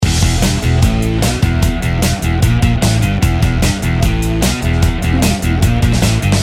super hero loop

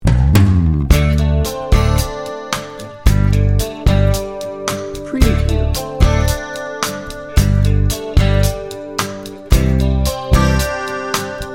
laid back country

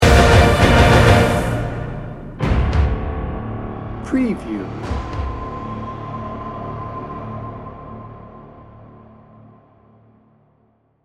sting bumper

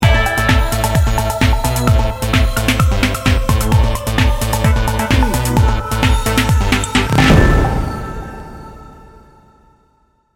electro energy sting bumper

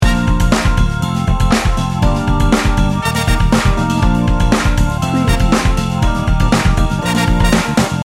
happy feet loop

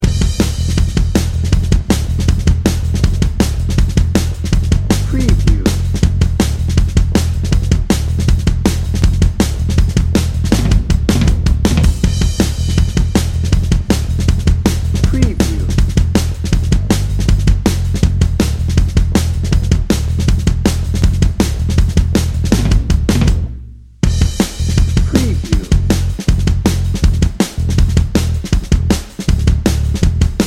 dnb

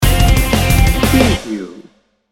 pop rock party sting bumper

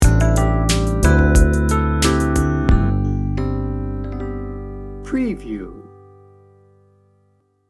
mellow reflections sting bumper

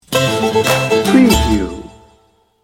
redneck hip hop sting bumper

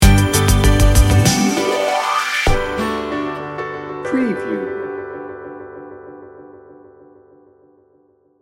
sunny and joyful light pop sting bumper

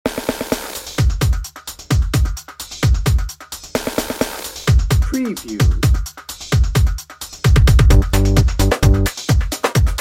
dnb

